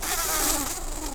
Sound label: bumblebees, Pascuorum queens